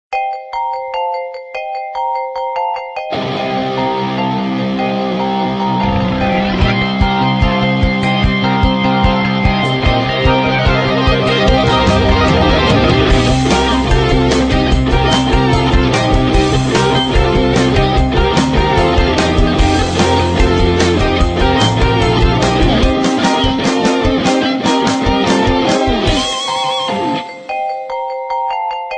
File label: iPhone Rock